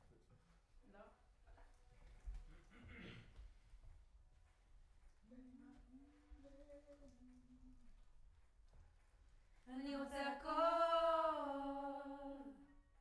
Choir Far

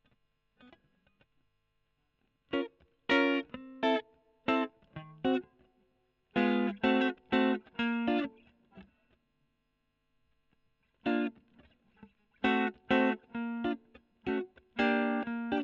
E.GTR Gefell

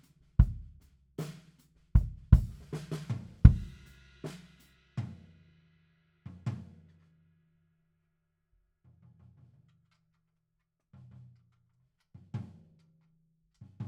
BD OUT

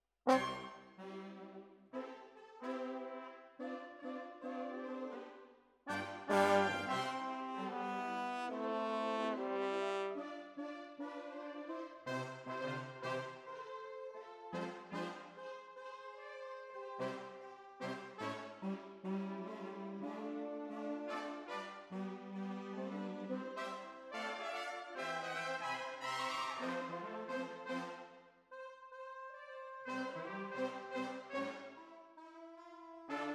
Horns

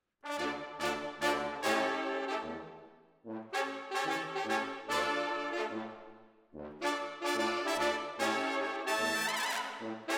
ORTF, Jazz for piano

